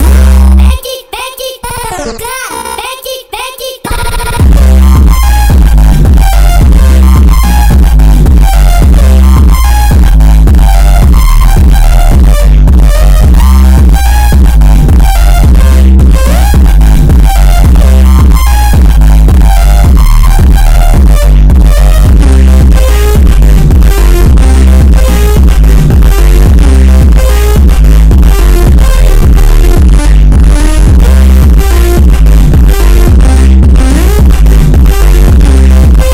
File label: reverb slow